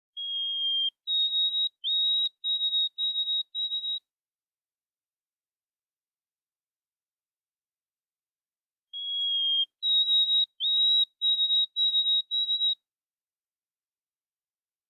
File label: chirp